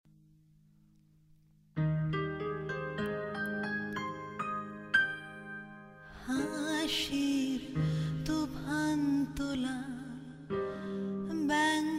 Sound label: Love Melody; Disha 2015